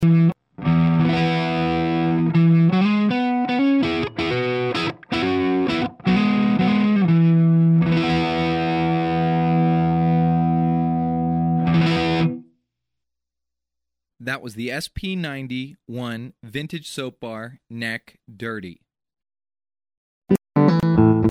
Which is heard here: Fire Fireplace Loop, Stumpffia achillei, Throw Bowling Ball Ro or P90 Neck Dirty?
P90 Neck Dirty